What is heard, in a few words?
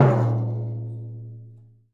Timbale; One Shots; Essential Percussion